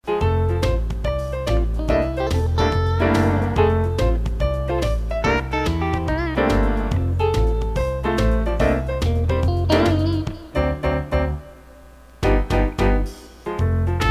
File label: bgm